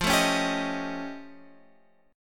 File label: F Augmented 9th F, chord